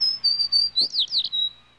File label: sparrow; ambients; PC Gamer 39